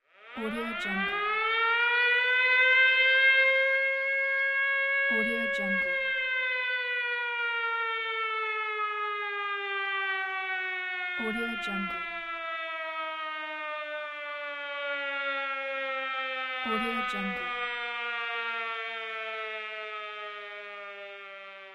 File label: siren